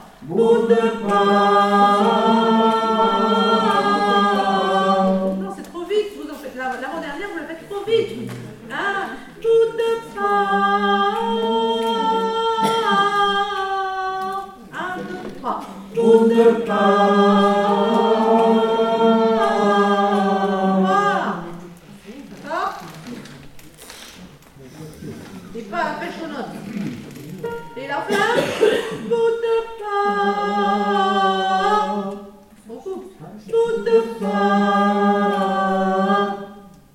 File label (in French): Fin Hommes, chorale